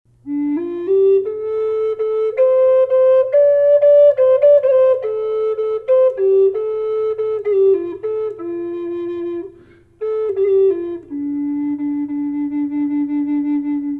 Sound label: humpback whale reverb low d, flute